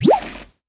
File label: Item Hammer Lift; In Internal Item Hammer Lift